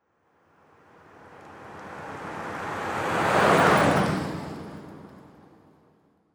50 packard passing, Road traffic; strassenverkehr

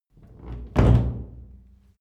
old door close hard

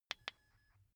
car key button click signal quick

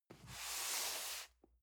cardboard box medium slide soft; objekte

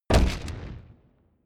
wood stack

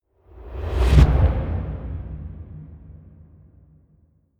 promo pad; whooshes-rises

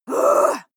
female shout upset; menschen

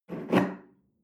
espresso grab tablecloth slide

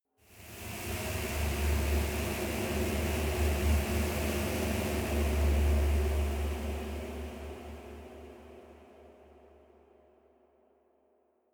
space unknown evil energy

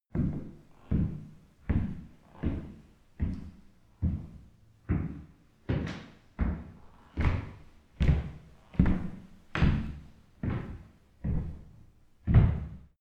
step shoe on wood

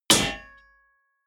clang medium

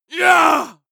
male combat hit hard; soundpool

